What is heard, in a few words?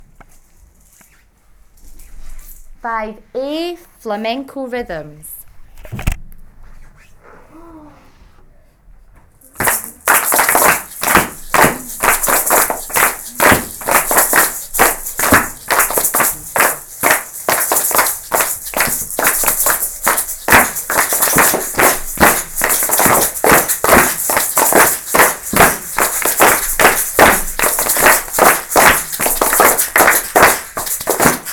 flamenco rhythms